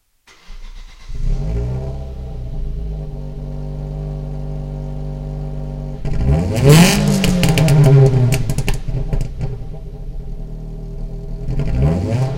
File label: exhaust